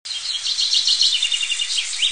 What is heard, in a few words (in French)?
Ambiance jungle; bruitage